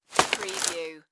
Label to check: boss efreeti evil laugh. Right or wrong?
Wrong